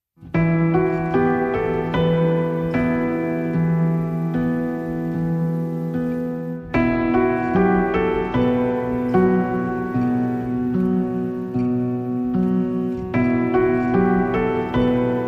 Short Conversations, Piano Only

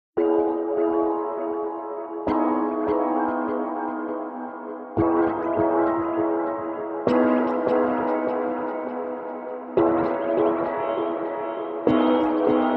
Chords

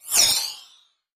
Quick Sharp Piercing Sweep

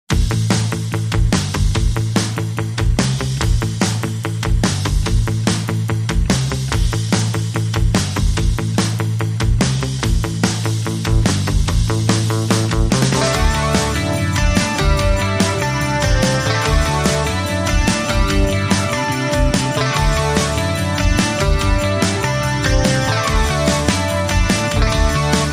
No Chuggy Guitar

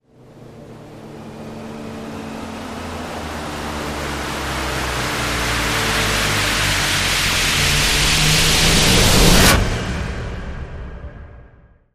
Rise

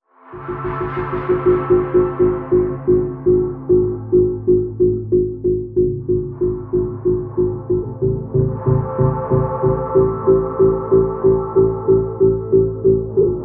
Pulsing Aura

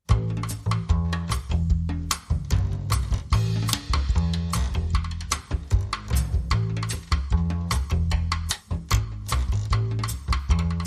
Rhumba Beat

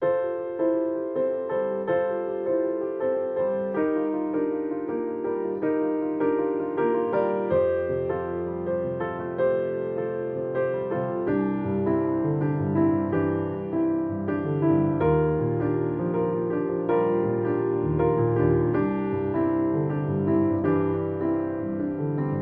Solo Piano

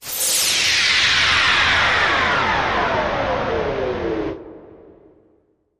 Big Space Wind Down

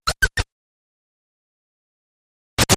Little Stutter

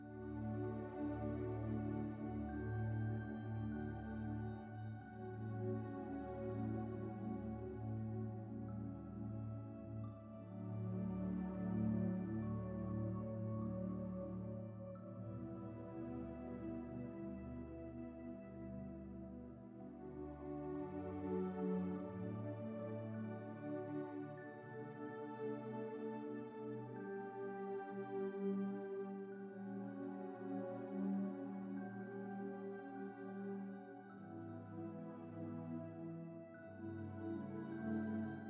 A Beautiful Day, Pads Synth 2

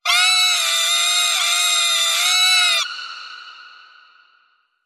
Horrifying Super Scream

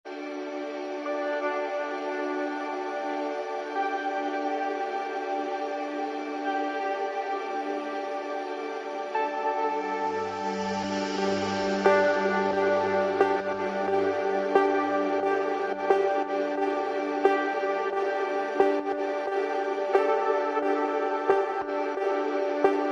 Ambient

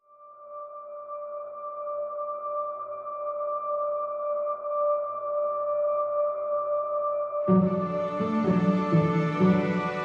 No Piano

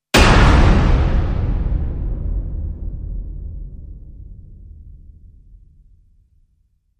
Massive Hit, Sting

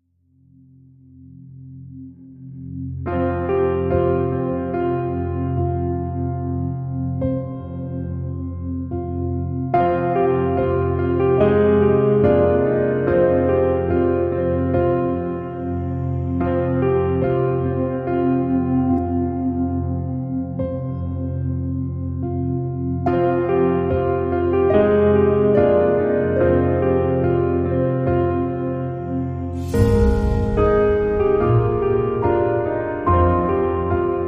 No Bells and Flute

